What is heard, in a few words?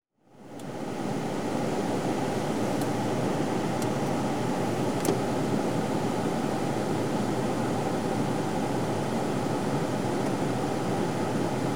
Car, Interior, Heating Fan; Auto; BBC Sound Effects Library